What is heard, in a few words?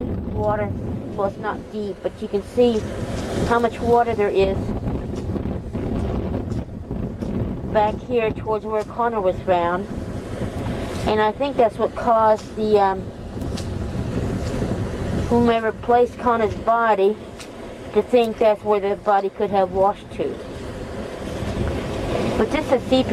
puddles